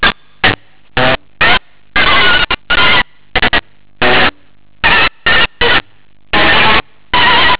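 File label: guitar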